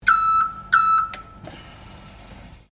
FORKLIFT HAND TROLLEY LOWER OPERATE STEREO, Hand operated fork lift trolley; Construction Vehicles